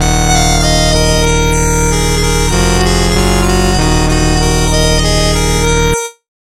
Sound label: Game Over